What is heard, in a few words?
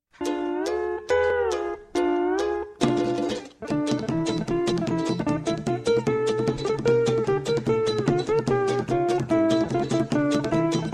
music idol carry; urn run